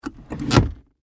Auto Emergency Brake Engage Dodge Stratus Automobile Car; Vehicle; Dodge Stratus 2000; Cars